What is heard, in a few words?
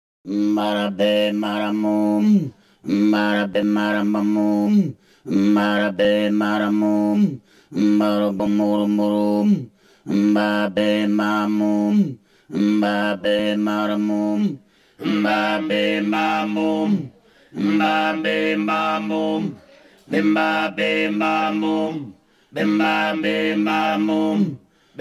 Italia, Chant D'Hommes Tenore; Mediterranean